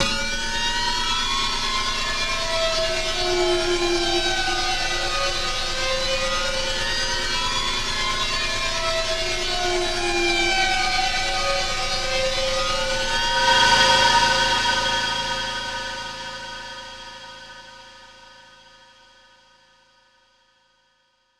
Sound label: Pad, PsyTrance